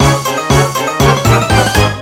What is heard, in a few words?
victory; tf2ware ultimate; music game; 3ds ashley